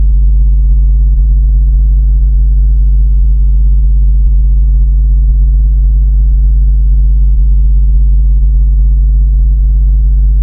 Laser Room

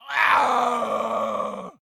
Mannequin Scream Lower Pitch; don t be afraid the first toy

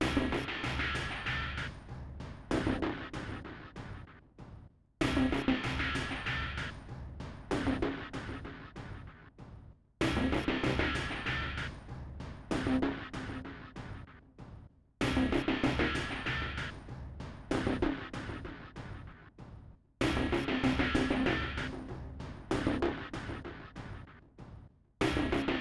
Cinematic Music - Tension

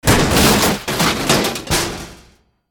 Door Breaking On Balcony

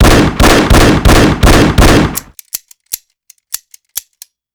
Shooting; Lucius III; Kimble

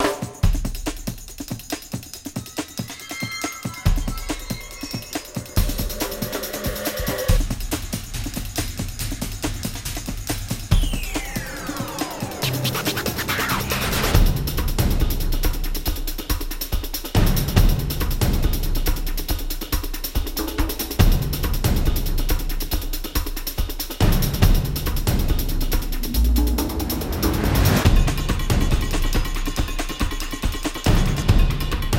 Big Drum Intensity; True Crime New York City; Xbox; Shared BigDrum Intensity

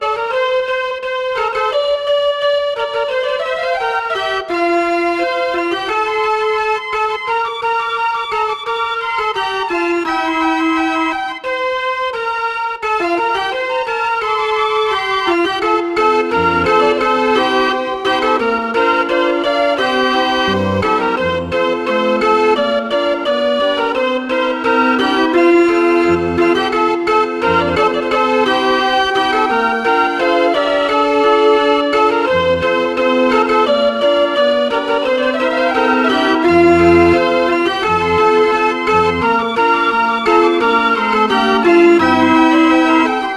Keyboard Instrument; Assassin's Creed Syndicate

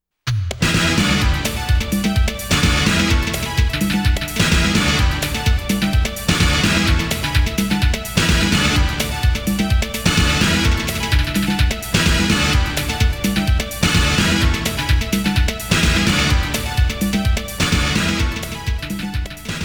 Clear Demo BGM; konami amusement sounds 93 autumn

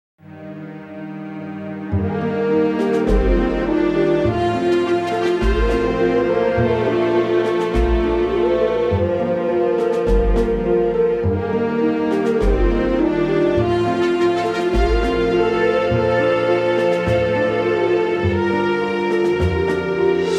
Howling Duet

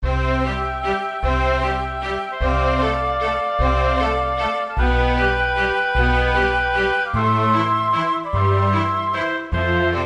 Waltz; The Rhapsody of Zephyr

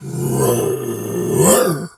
bear roar

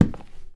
Inside Foot Step; Welcome to the Game

